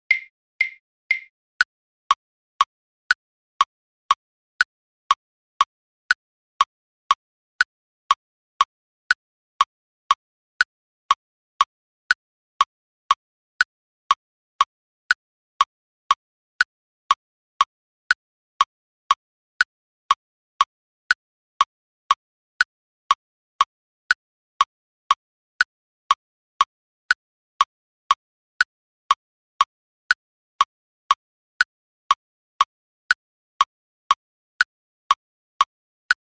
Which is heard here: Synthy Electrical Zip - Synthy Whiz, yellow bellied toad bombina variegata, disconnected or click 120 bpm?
click 120 bpm